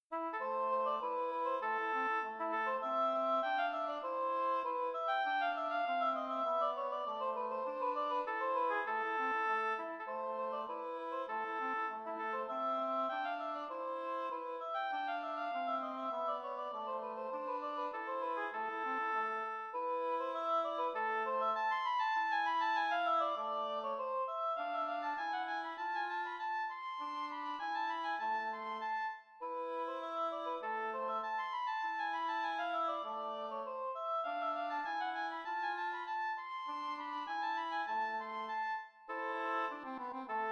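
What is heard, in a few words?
Spanish rondo oboe trio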